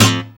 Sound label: PULL, Acoustic; Sound & Vision; Gigapack I CD 2; GUI ACOUST.; GUI Acoustic 1